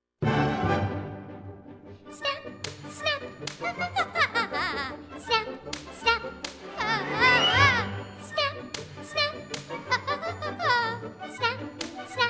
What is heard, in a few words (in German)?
Tritsch Tratsch Polka